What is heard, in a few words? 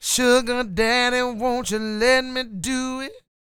SING W, Vocal Planet Blues & Soul, BLUESY; ILIO